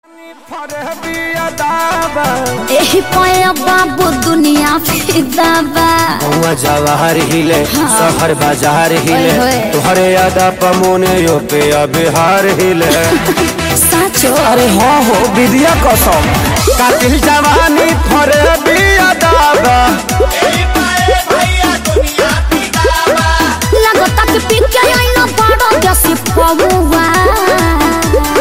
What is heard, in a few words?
Bhojpuri, ringtone